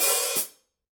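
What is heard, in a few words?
signature precision 14 heavy hi-hat open, cymbales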